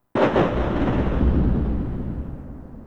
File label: thunder